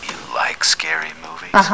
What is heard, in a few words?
scream